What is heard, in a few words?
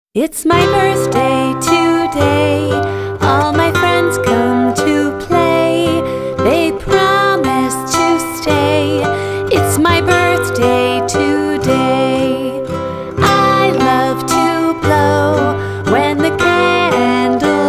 Birthday Song